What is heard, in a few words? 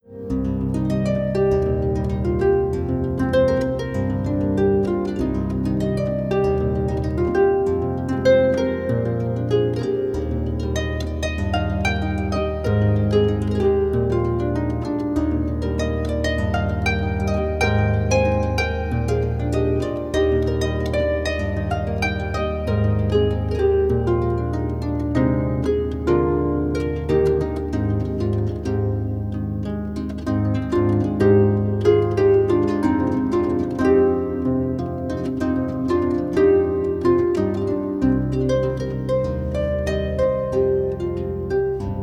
jazz; Harfe